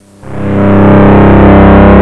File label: industrial, electronic sounds; Sound Effects for Multimedia - Volume 1